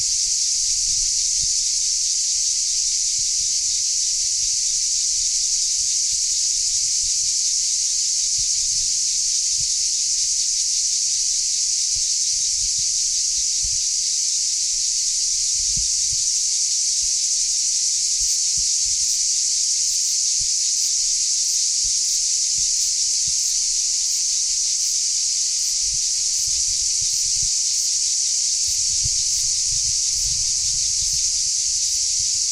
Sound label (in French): cigalles